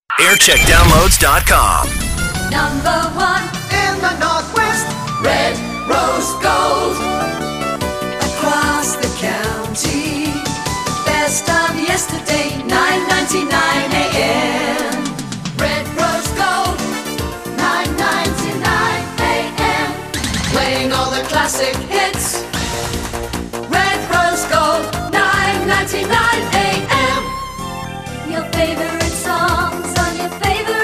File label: Jingle Montage; Red Rose Gold